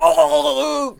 Hwacha Death Vocals

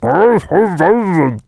Vampire Attack Vocals

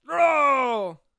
Bomb on a Stick Attack Vocals

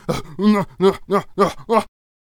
attack vocals; Traveller

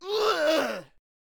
Berserker Death Vocals

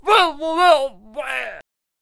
Potionseller Death Vocals

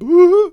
Healer Death Vocals

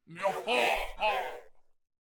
Dark Peasant Attack Vocals

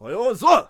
Monk Attack Vocals

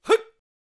Chu Ko Nu Attack Vocals

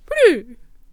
Painter Attack Vocals

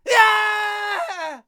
Samurai Death Vocals

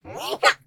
Banshee Attack Vocals

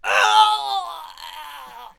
Archer Death Vocals

